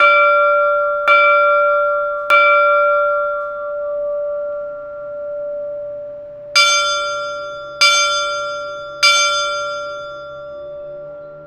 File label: Comparison of Internal and External clappers